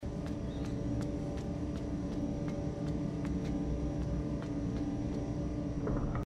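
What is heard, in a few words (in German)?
Scheinwerfer Licht; inside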